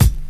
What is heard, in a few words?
kick; x.shulz lofi kit